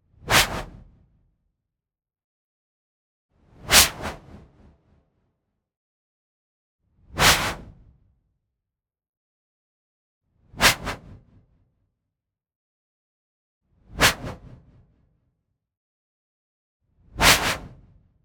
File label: WHOOSH White Noise Aggressive Fast; boom library; Anime Essentials